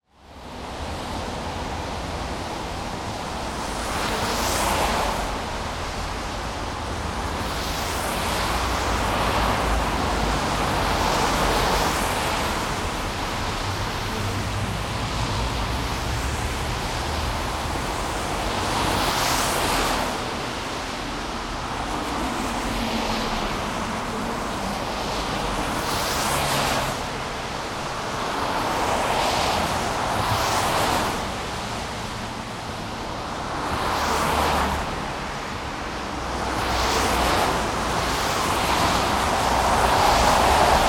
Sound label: city road traffic in the rain; urban sounds